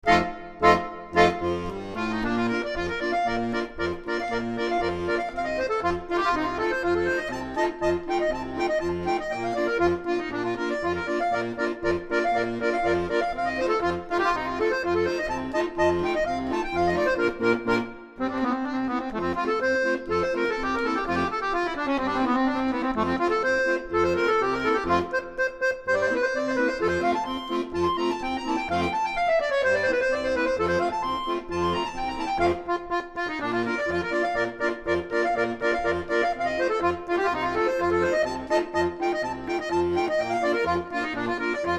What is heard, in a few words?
Clarinet Polka, wedding music